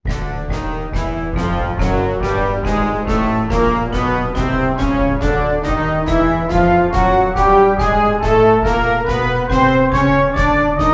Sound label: Chromatic Scale Exercise